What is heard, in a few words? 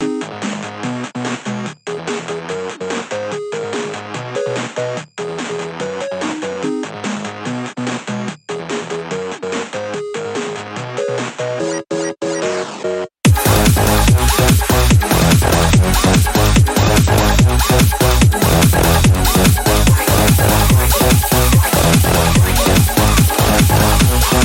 Instrumental; Friday Night Dashing OST